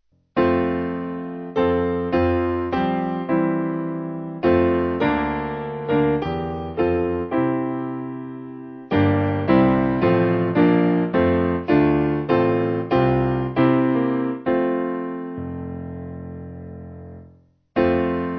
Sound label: SPiano; Drop Drop Slow Tears